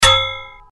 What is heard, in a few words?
item finished